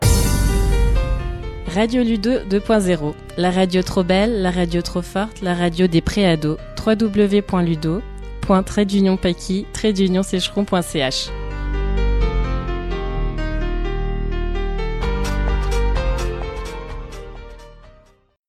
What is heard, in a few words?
Jingle